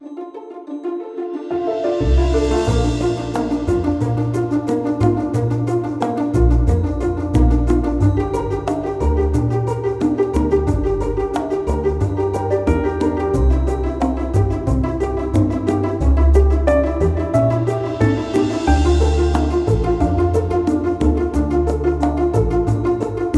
cheerful entertainment pizz; by burak sound bank; cheerful vol 4

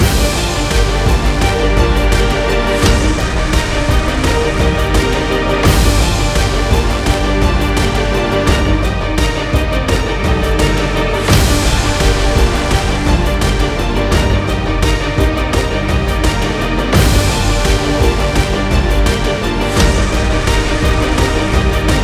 cinematic; by burak sound bank; tension gerilim vol 51

